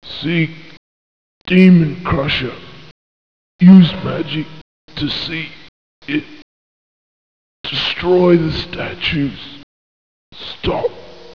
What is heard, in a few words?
dying